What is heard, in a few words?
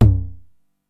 TOM H, 909; musicradar-synth-samples; Classic drum machines; 909TOMS